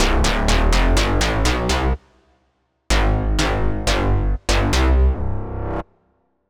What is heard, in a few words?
Big Boom Bass; Preset Sample